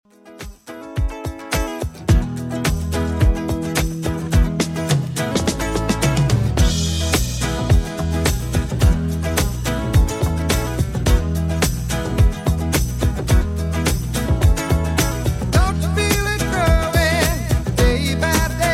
70s Redrum 107bpm

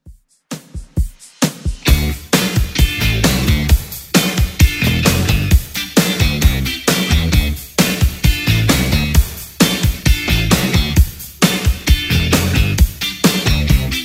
80s Redrum, 132 bpm

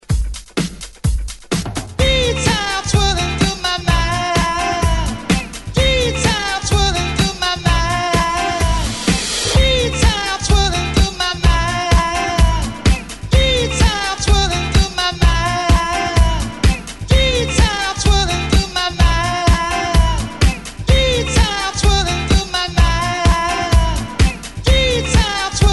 70s ReDrum 127 Bpm; DJDOLLS

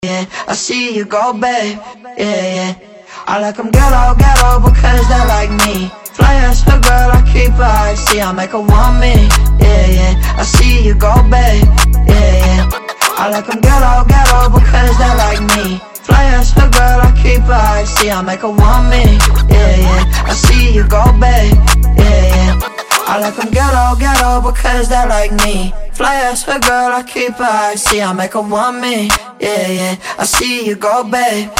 Acapella Intro Outro 97 Bpm

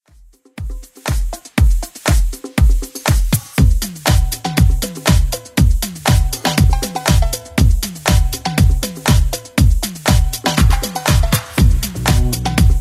80s Club Edit 120bpm

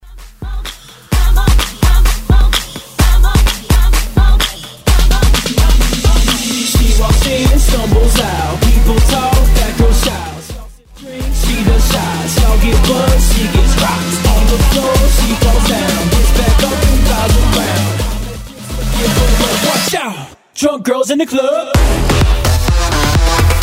Quick Banger 128bpm

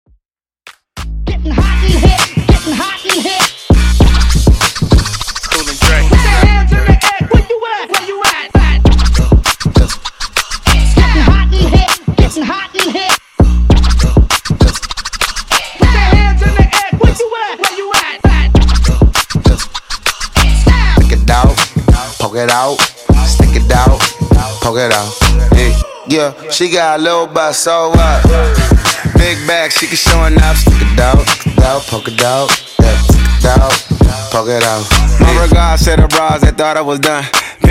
Funk Scratch Break, 99 Bpm

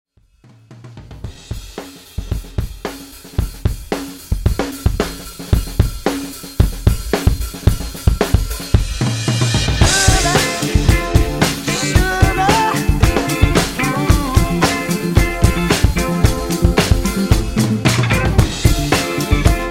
70s Pop Rock ReDrum 112Bpm

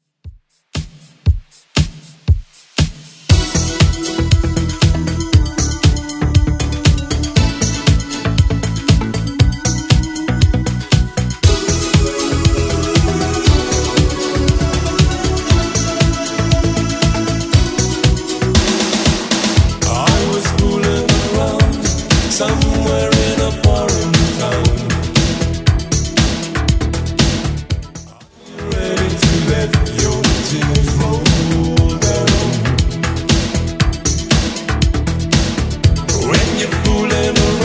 80s Redrum, 118bpm

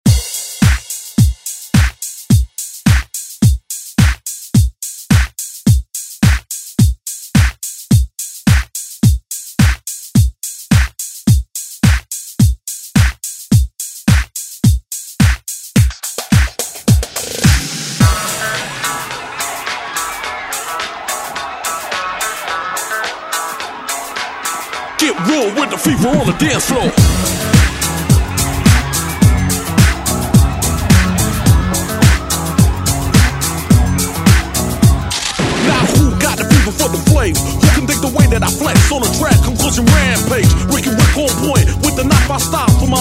ReDrum, 90s; DJ Mhark

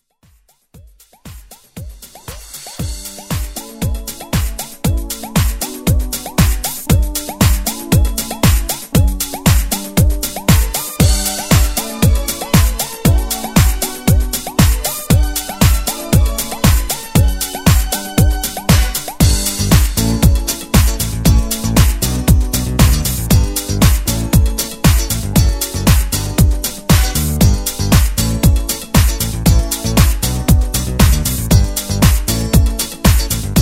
80s Rap Redrum

